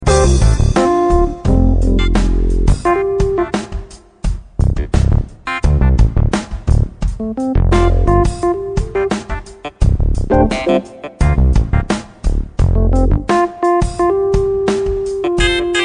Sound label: funk jazz